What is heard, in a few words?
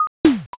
vending